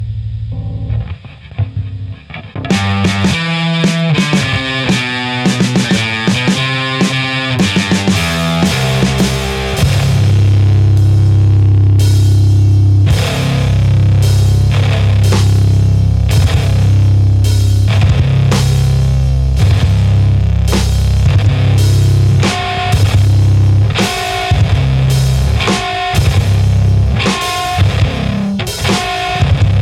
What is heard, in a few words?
drumkit, instrumental chaos sludge; I don't think Dale would approve of this; FAWM